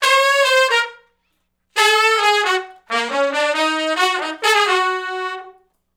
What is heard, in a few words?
R B Riff (Eb) unison, Horncraft; Sections